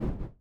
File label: step swamp